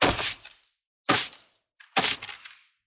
broom shaker, Foley; Crowdsourced Sample Pack; TRUTH-HZ

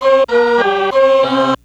Mellotron, Melody Loops; TRUTH-HZ; Crowdsourced Sample Pack

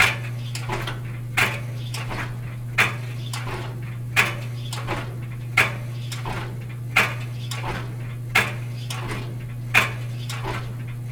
laundry, Foley; TRUTH-HZ; Crowdsourced Sample Pack

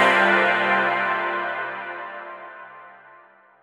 Pad, One-Shot Synths; Crowdsourced Sample Pack; TRUTH-HZ

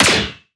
Snare; TRUTH-HZ; Crowdsourced Sample Pack; Drum Kit; Claps & Snares

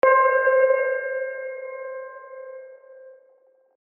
sounds like a bad sabmarine, One-Shot Synths; Crowdsourced Sample Pack; TRUTH-HZ

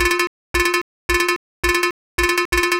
repeated cling, Melody Loops; TRUTH-HZ; Crowdsourced Sample Pack

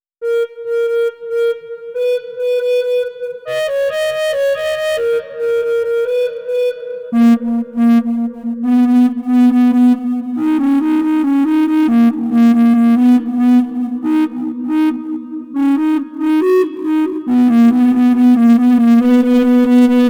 NEAR80 DISTORT MELODY 139BPM; Melody Loops; Crowdsourced Sample Pack; TRUTH-HZ

